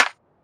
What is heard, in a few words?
snare; dough-samples; eddyflux